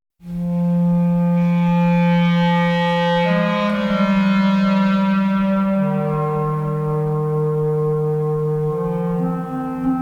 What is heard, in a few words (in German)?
Melodie Lauda Novella